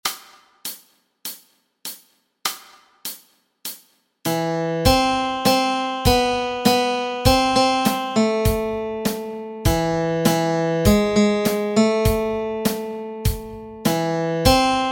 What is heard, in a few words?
melody